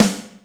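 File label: Snare Overtone, Alesis D4 drum machine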